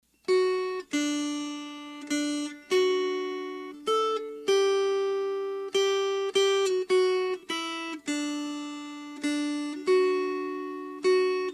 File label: Melody